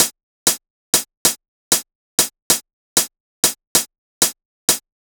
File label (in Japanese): トレシージョ